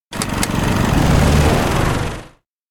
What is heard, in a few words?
elec altitude descend; anemoi